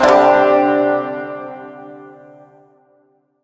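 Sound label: Shawzin Pentatonic Major Chord D; Chords; Warframe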